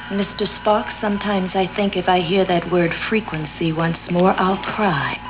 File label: cry; trek; women; classic